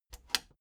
plastic button latch scratch disable fast

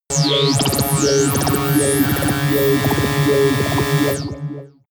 alien synth

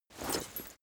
leather metal movement soft slow single

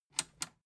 metal button tiny click enable slow

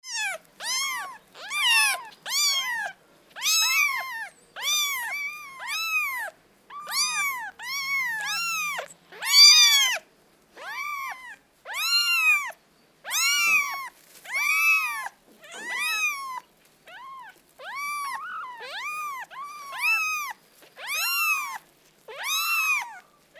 kittens

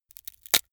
wood sticks small crack short

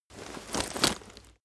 leather metal handling short

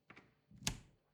refrigerator door close moan

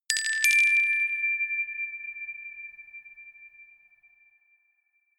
notification glockenspiel rattle fourth up

